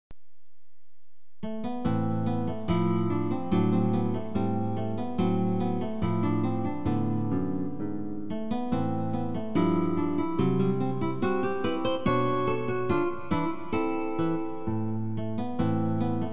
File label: prelude guitar